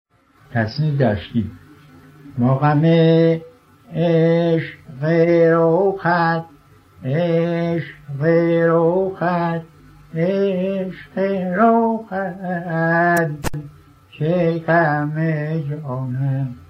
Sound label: tasnif, dashti